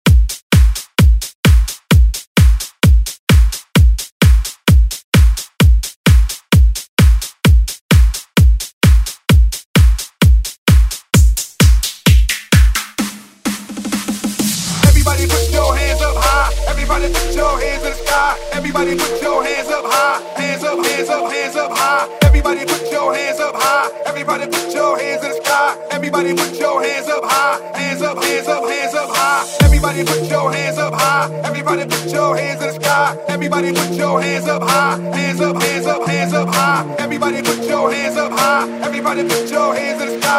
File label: Party Hype Redrum; Deejay Hit